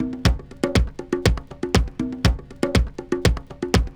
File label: BRASIL UP